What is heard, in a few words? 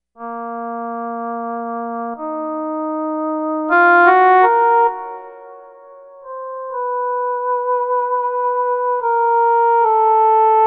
Sweet Oboe